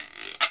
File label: sfx perc